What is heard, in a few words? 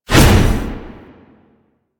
Hammer Whoosh; Warframe; Veilbreaker; Sprag